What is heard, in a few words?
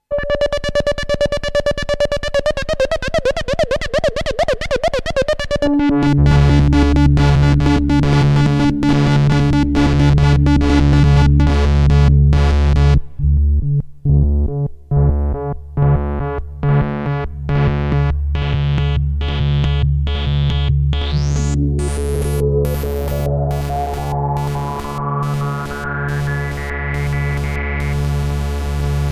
SFX Motion Filter